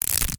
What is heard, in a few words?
hammer build wood; Rust